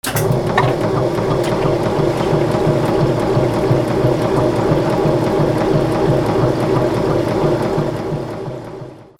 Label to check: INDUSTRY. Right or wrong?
Right